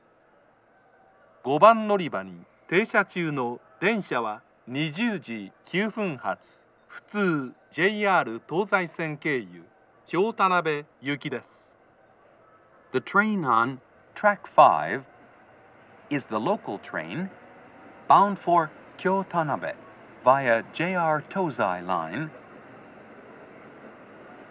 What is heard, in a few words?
amagasaki local kyotanabe; station; jr-west